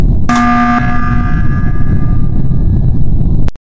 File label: loud emergency meeting